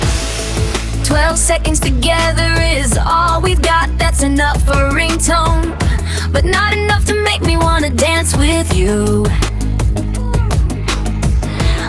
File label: ringtone dance party; stablediffusion